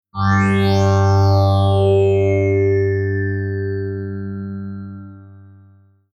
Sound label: Low Res Synth Sweep Scifi Digital Warm Future Space; Sound Design; Logo; Sweeper Sound Effects